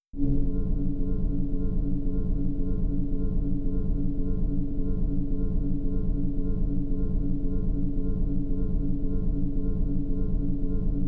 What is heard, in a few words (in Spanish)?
ambient PAUSA opciones; android ios macos mobile ps4 switch windows gamerip 2019; Sonar Beat